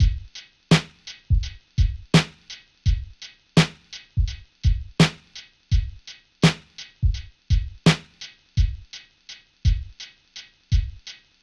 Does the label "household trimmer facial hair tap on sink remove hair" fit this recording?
No